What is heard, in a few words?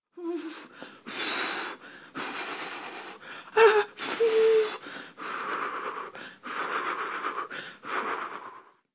Breathing through contractions, de, Adult, Female